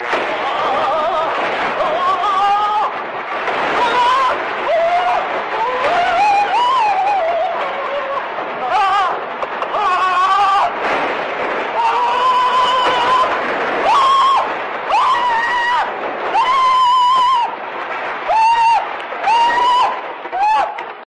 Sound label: electrocuted